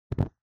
Lighter Flame

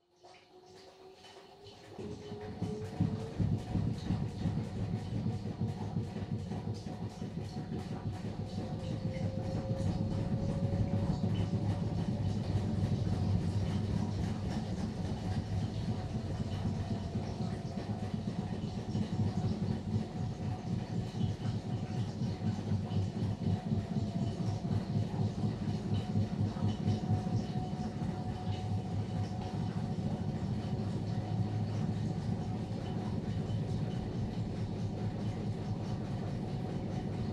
Washer Front Load Noise Far Spin

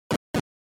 NES General Noise

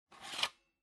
Floppy Disk Drive Eject

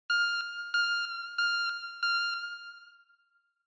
Retro Anime System Mid

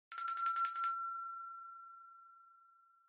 Winning Bell Strong

